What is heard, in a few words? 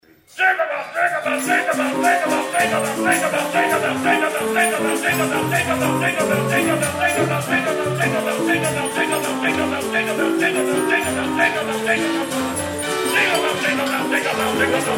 Jingle Bells